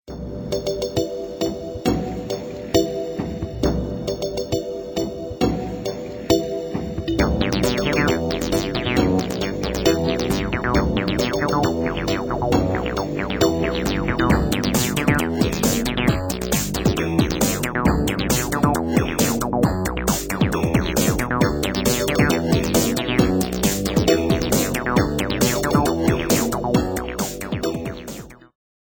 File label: electro mix